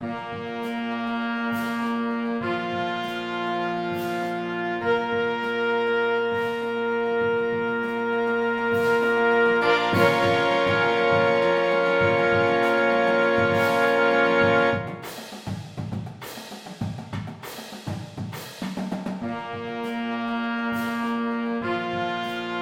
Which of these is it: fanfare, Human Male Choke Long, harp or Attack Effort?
fanfare